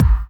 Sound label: BD; Best Service; Dance Mega Drums